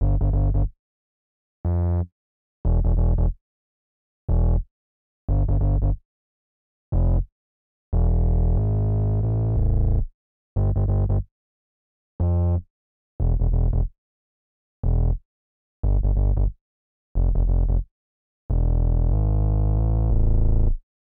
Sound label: Bass Groove